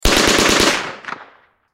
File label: Gun Submachine Gun, 9mm German Mp40 Short Burst Close; Automatic; Close Perspective; Warfare; Blastwave